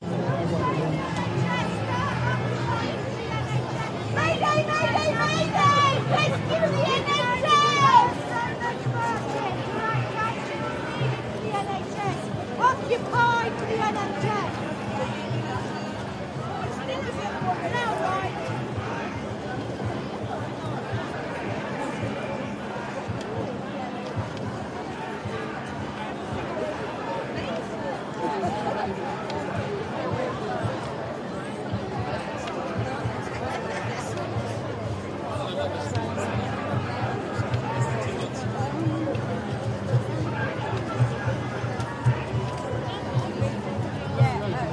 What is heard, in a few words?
atmos protest walking into chants megaphone whistling band nhs; london music mixing